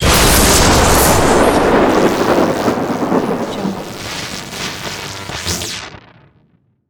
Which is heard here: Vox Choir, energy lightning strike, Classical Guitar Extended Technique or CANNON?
energy lightning strike